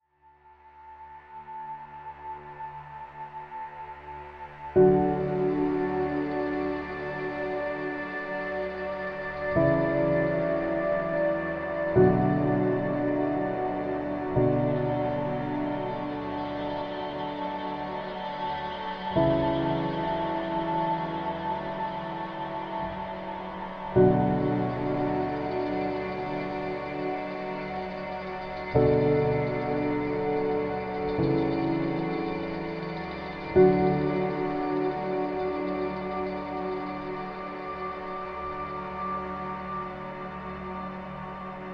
Smooth Piano Ambient; RELAX

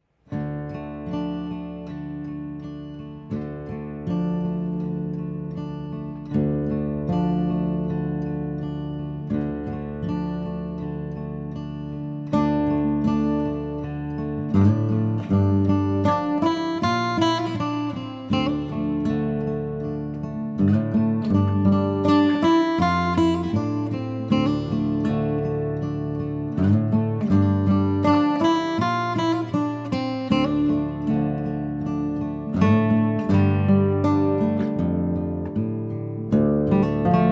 Guitar Cover

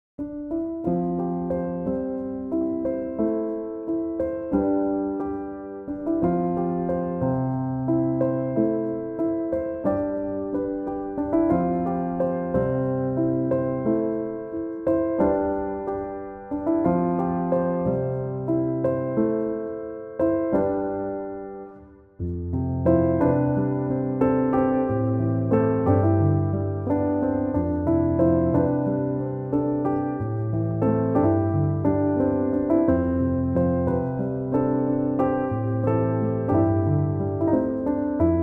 piano instrumental

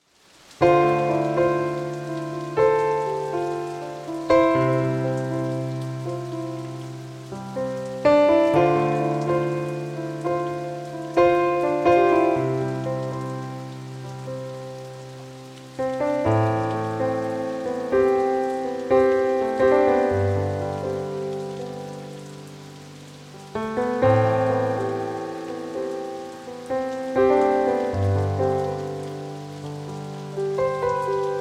Amazon Rainforest; RELAX-NATURALEZA

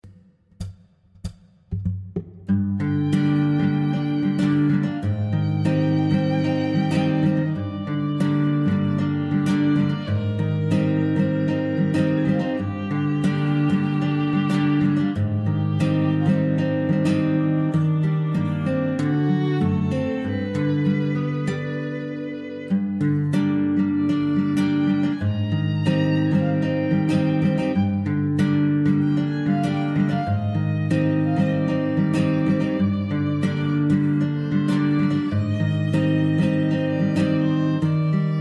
Gypsy Flamenco

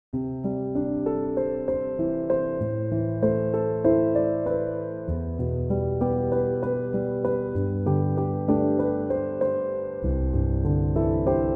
piano instrumental

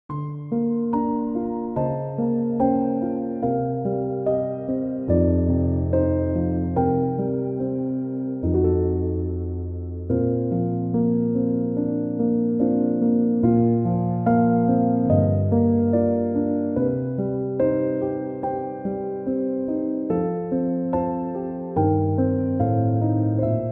piano instrumental; Pinocchio

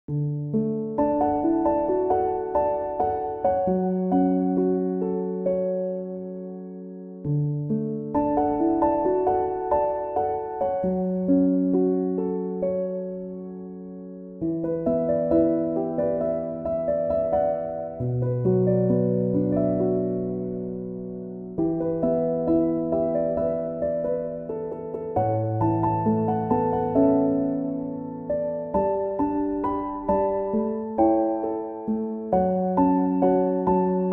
relaxing piano instrumental

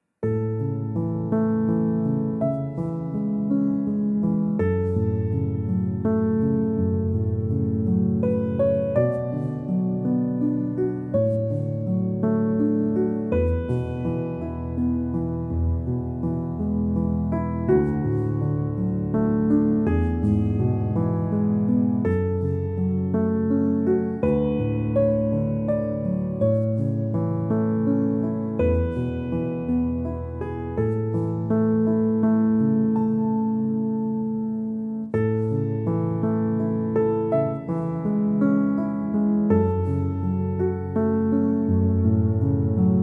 Calm Piano Version

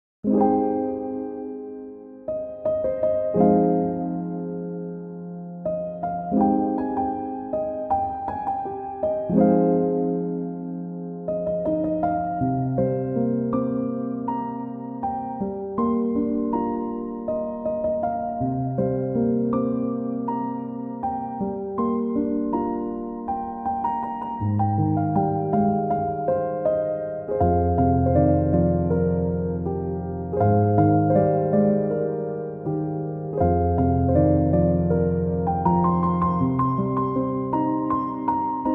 chill piano instrumental

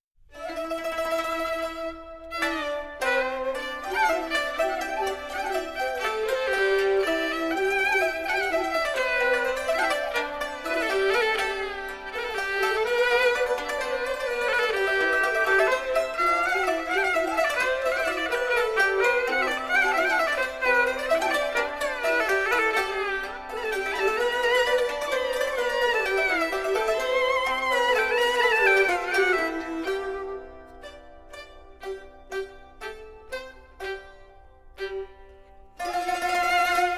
Cantonese Music Ensemble; CHINA

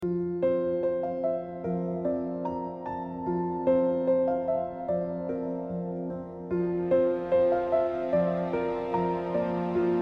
Piano Instrumentals; hotusa recepcion y pasillos

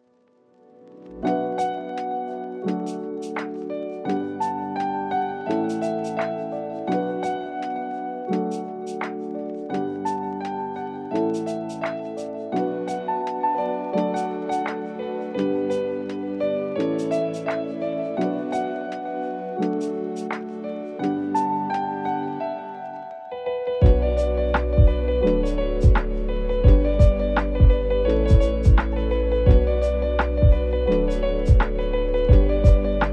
Instrumental Lo-Fi

